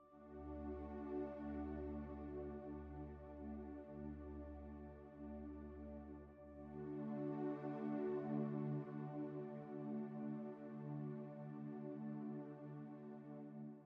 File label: Pads Synth; Pads Synth 1